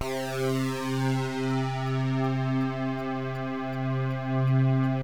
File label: JUPITER 6, SYNTH